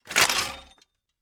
flamethrower reload

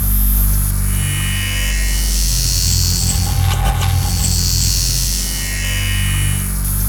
gauss siege loop; doom gauss

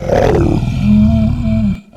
die

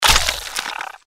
mirelurk death; npc

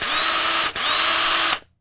drill

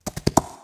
horse run

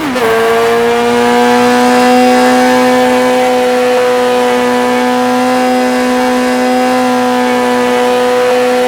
simulated vehicles gear

